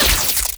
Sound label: red lightning medium